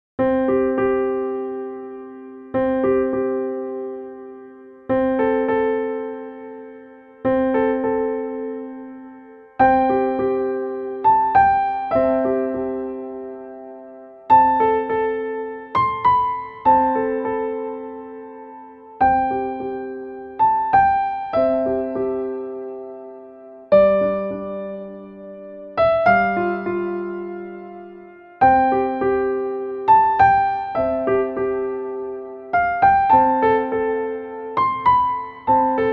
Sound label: Simple peaceful piano melody; Ujjwal Music classes; Goregaon (W), Mumbai, India